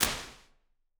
Early Reflections Studio B Far R, Impulse Responses; Ableton Live 12 Lite